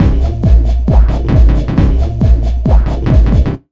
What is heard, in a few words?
Big Beat Drumloops, LOW 135